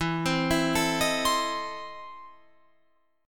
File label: E Major 7th Suspended 4th Sharp 5th E, Guitar, Open F; 16,19,16,16,18,19; arpeggiated